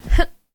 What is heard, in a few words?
Jump; MiSide